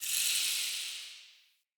Fey Mist Heal

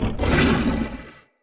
Corrupted Battle Dog Attack Bark; Animals; Vocals

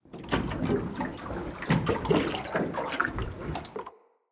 Water Lap Creak Under Dock Slow Deep; Water Laps

